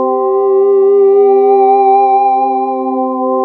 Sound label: SYN K-2000, Roland